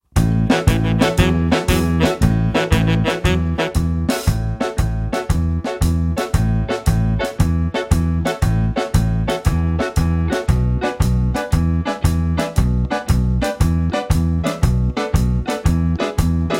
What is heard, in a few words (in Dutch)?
karaoke